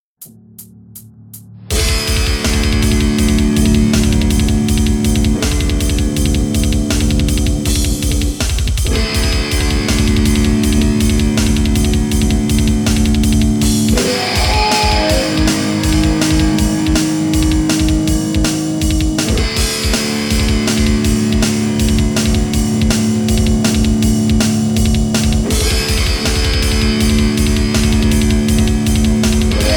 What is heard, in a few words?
Battling Rain